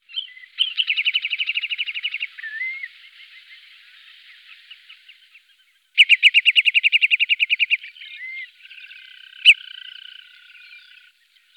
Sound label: marsh sandpiper